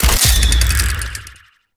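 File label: bird arrow, attack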